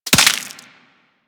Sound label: Headshot Impact Flesh; Warframe; Weapons; Bullet Impacts